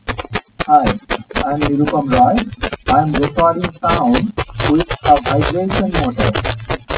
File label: voice vibraphone